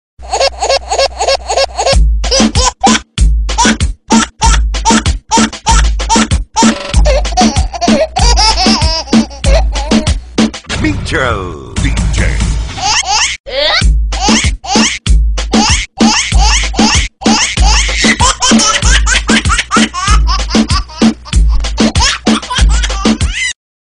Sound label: funny dj baby remix